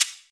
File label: Shaker One Shot A# Key, Royality free shaker sound sample tuned to the A# note; percussion